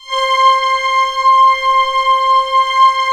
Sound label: orchestra C5 PP; Sound On Sound - Peter Siedleczek's Orchestra